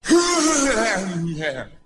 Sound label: "hoohangyeah", voice; ooh ahh; ho hoo woo